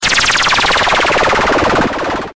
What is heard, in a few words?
Laser sound Sms